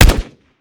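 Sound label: akilo47 fire player, weapons; viper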